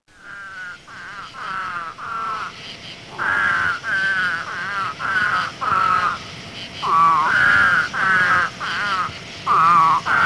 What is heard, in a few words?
Call - Eastern Spadefoot